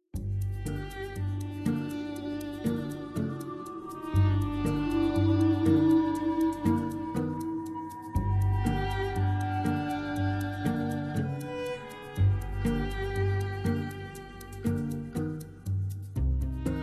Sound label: Organ Grinder